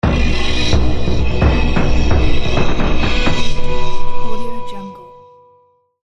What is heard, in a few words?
vikings battle alert